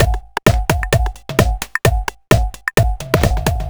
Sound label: Skip, Drum Loops 130bpm; Zero-G; Soundclash Nu Dance Electro; Acidized Wavs; Snap Crackle